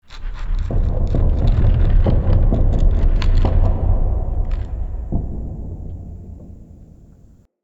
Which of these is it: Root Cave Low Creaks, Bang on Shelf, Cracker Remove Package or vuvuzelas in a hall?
Root Cave Low Creaks